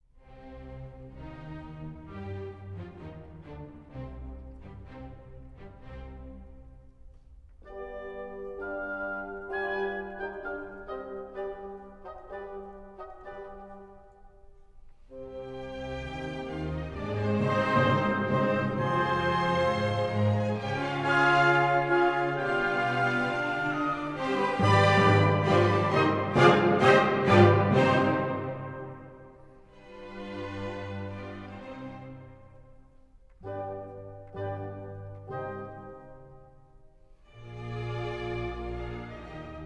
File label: Piano Concerto No. 3 in C Minor; Op. 37; Beethoven